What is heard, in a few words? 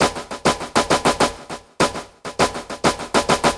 Electronica Drumloop; Drums & Percussion